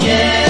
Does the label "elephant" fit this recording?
Yes